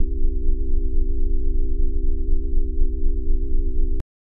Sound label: c major chord bass leslie fast, hammond